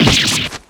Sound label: damage